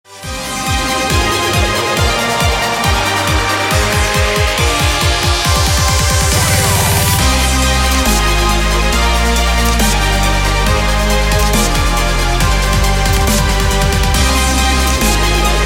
bass instrumental version

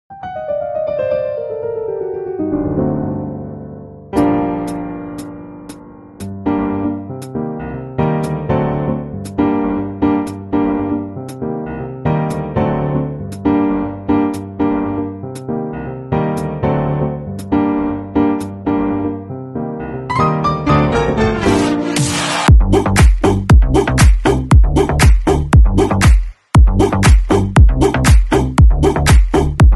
instrumental

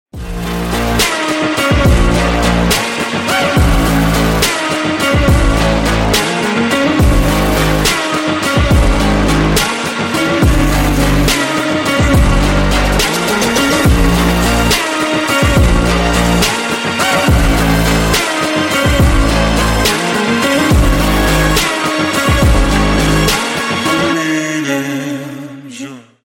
instrumental

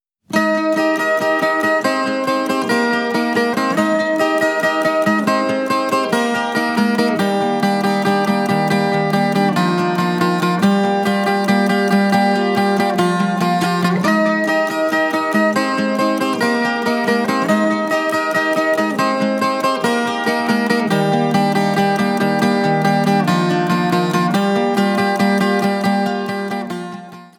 acoustic intro

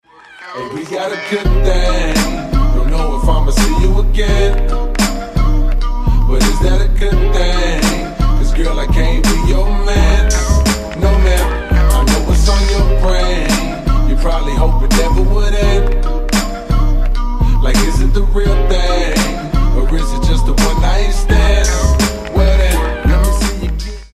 slowed reverb

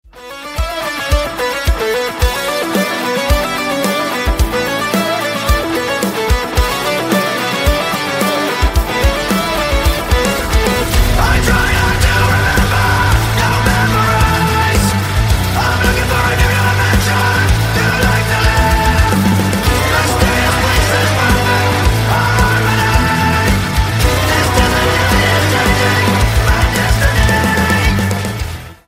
metal cover

